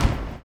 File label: STOMPER; Spectrasonics Vocal Planet